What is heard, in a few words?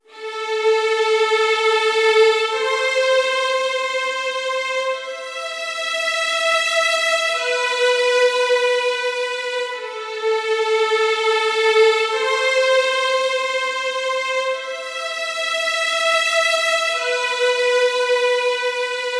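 Violins - D minor - 100BPM; Construction Kits; STEMS; Ghosthack; SkyRealm; Kit 17 - Forgotten Glory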